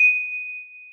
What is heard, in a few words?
celesta, programmable speaker